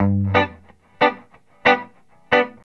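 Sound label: reggae electric guitar G; Best Service Advanced Media Trax Modern Composer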